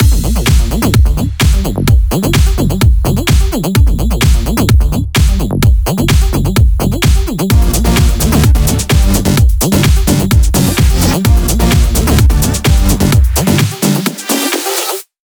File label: 128BPM Mix; Vengeance Samples; VFH1 128BPM E Samba Kit